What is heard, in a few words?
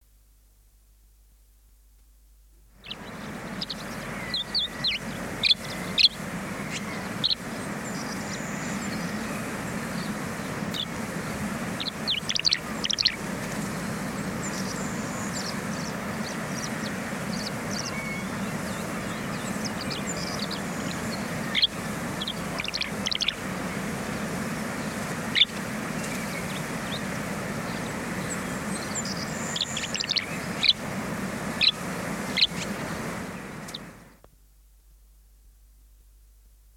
tree swallow, songbirds